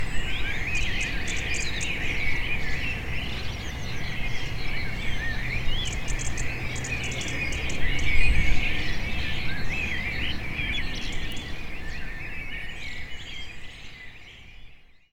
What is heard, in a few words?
Nature Sounds